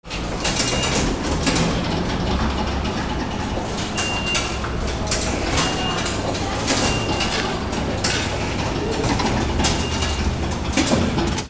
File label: AMBIENCE